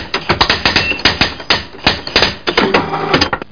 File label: PRINTER